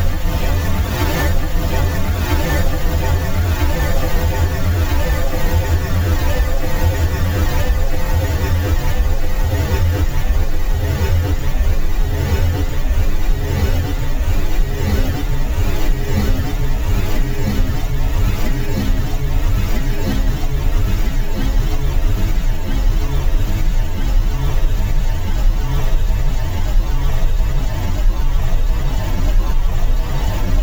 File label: warbling